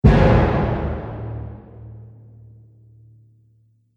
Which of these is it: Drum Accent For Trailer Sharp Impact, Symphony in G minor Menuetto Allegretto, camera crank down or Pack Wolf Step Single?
Drum Accent For Trailer Sharp Impact